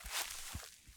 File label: Rustly Step, Footsteps, outdoor